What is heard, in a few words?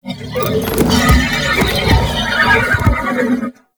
Horse Idle Rodeo Short; Warframe; Duviri Horse; Vehicles